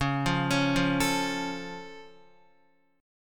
Db Augmented, down, Guitar; arpeggiated; JGuitar

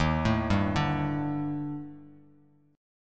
D sharp Major 7th Flat 5th, down, Bass Standard; arpeggiated; JGuitar

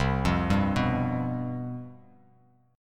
C Major 7th Suspended 4th, down, Bass Standard; arpeggiated

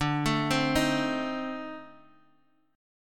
C Minor 6th, D, chord

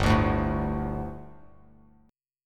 G Suspended 2nd G, down, Bass Standard

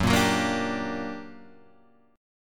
G Minor Major 7th, Gb, guitar chord

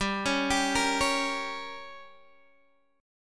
G Diminished, Guitar, DADGAD; x,10,11,12,13,11; down

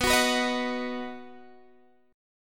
D sharp Minor Sharp 5th B, chord

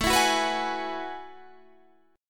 F sharp Minor 11th

